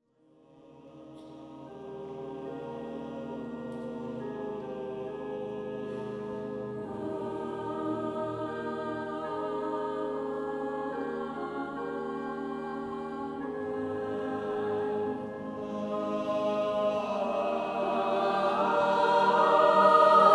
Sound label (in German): Geistliches Lied